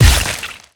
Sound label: Bounce